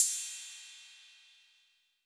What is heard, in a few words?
Cymbal 808 Decay, Clean Digital